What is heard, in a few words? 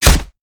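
armadillo basic attack; rammus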